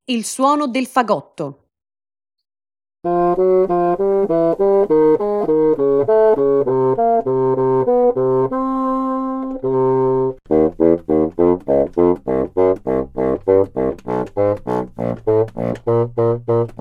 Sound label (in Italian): fagotto